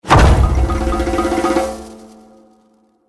swap stampede in; Stampede Call of the Pride; Eyecon

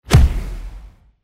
kick; Football! LuckyTap; Eyecon

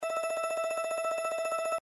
prize rollup; Fluffy Favourites Lucky Tap

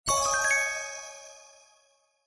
symbol win star; Fondant Favourites StarTrail; Eyecon

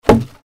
bonus caber land; Highland Reels

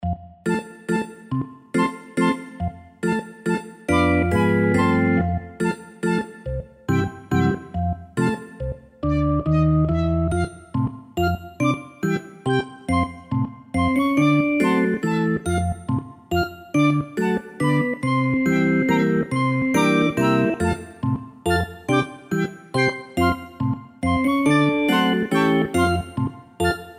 bg music; Fluffy Arcade: Doubleup Ducks LuckyTap; Eyecon

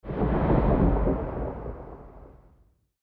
lightning Rumble; Champions of Valhalla

